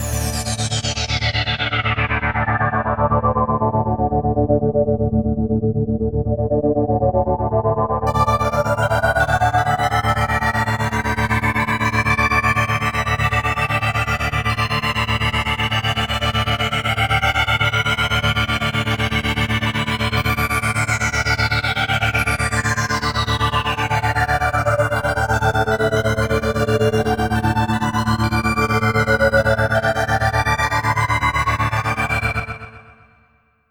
drone shifting

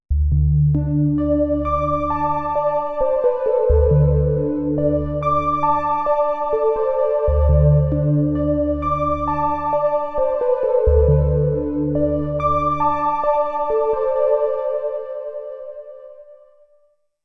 VCF oscillation

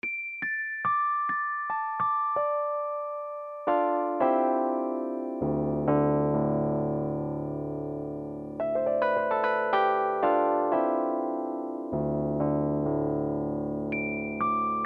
V-Piano

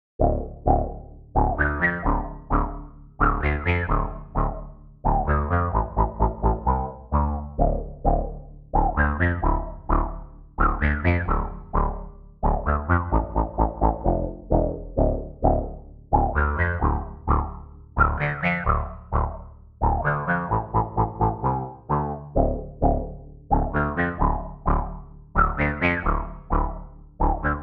Pong

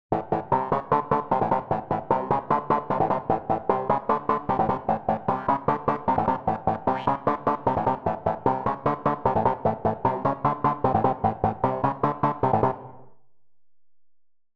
Blip

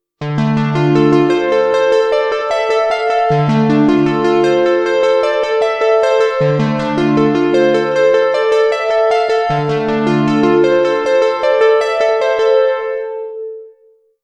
Hollow Plucker

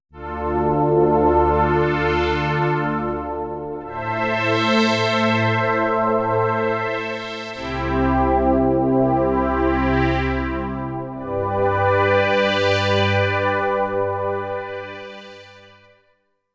Sweepy Pad

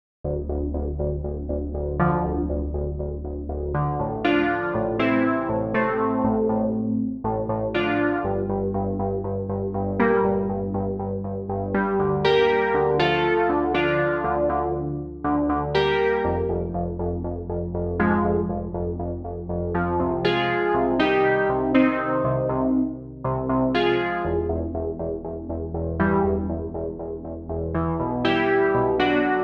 Synth Piano, Roland JU-06; pianos; SoundDiviner Project; Roland JU-06 Pack05; DigiD pianos pack

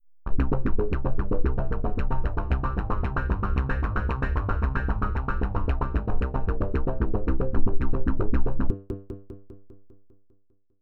Blips

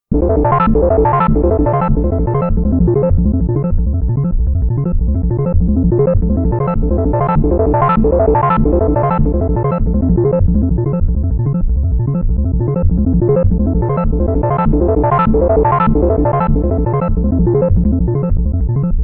Metal Bass

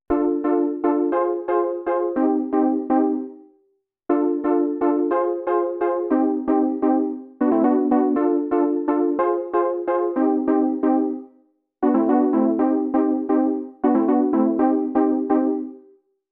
trance anthem

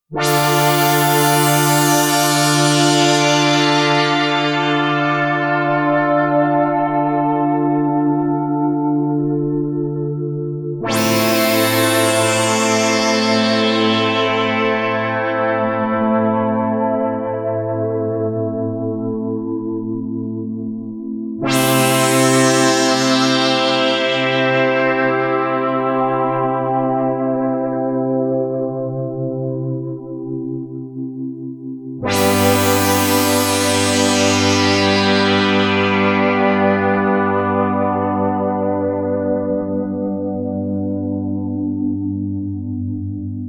Polysix touch sound

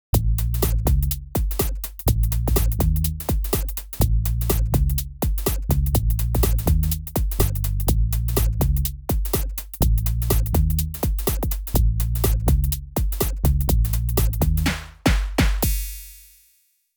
Sub Bass, Roland JU-06; SoundDiviner Project; Roland JU-06 Pack03; DigiD bass pack

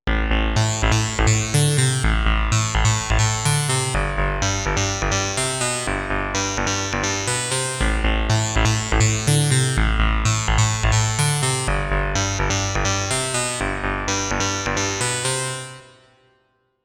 Velo Resonance

